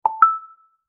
Notification ping

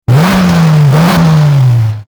car engine rev

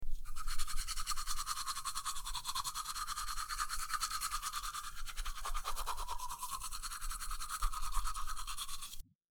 brushing teeth

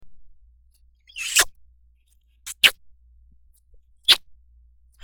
Kiss

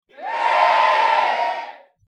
crowd ambience; Sound FX

